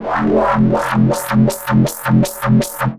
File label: bass tweak beast F; Polyend; ARC Noise